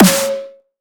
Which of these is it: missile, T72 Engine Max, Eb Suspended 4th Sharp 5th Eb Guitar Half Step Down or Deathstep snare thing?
Deathstep snare thing